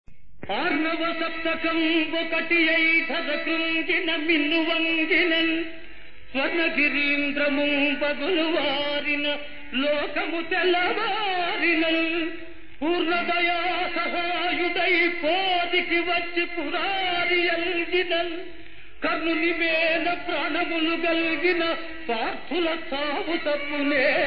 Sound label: Padyam; SriKrishnaRayabaram(1960); Arnavasaptakam